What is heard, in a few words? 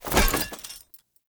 Heavy Grunt Foley Body Fall; Sinecure; Enemy